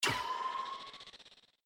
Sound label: item launch